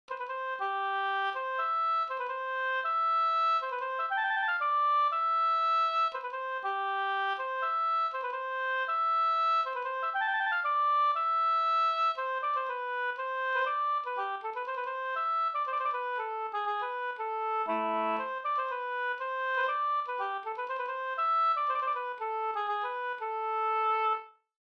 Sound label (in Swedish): polska Bodapolska